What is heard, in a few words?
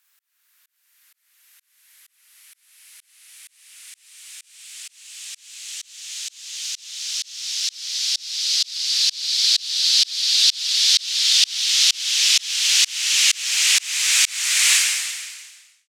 Medium Sweep, White Noise